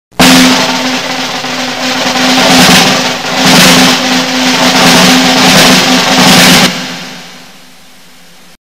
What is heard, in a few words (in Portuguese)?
Que rufem os tambores